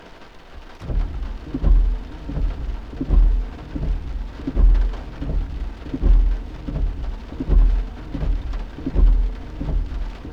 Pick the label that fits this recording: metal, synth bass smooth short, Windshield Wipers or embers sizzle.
Windshield Wipers